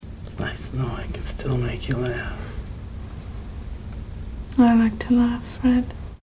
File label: laugh